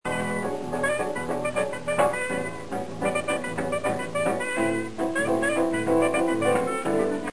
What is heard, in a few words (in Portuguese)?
ritmo swingado; Excerto musical